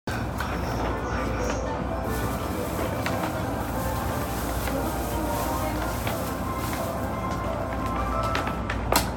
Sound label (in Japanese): 京浜東北線鶴見北, train sounds